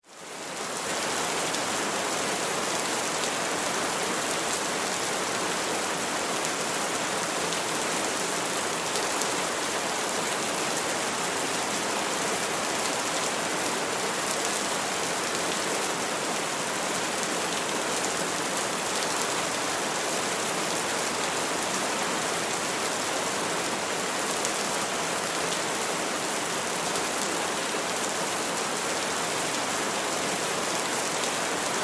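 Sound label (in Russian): Zvuki priroda Liven, nature sounds downpour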